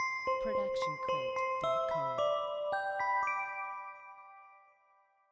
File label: bright bell jingle